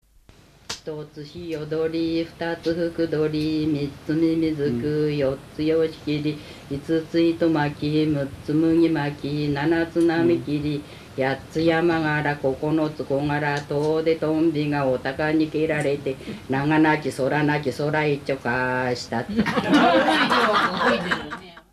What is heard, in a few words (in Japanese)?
temariuta, minyou